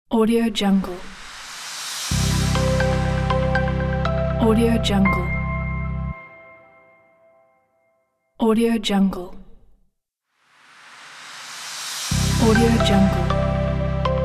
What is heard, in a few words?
inspiration logo; redfoxpro